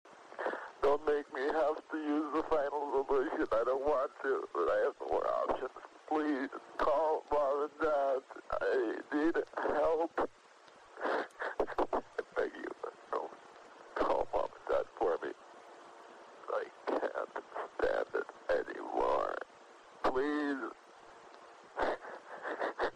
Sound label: Crying